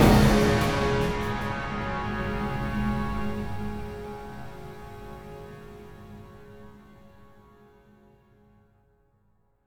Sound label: Menu Music End; Scourge Outbreak